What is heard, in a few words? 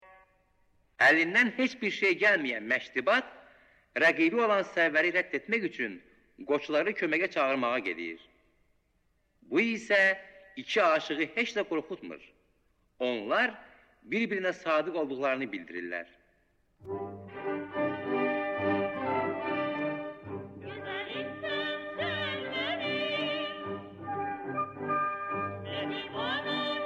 duet; gul sarvar